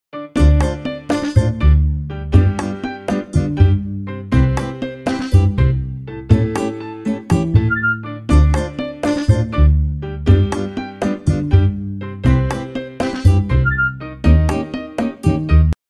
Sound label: sunny salsa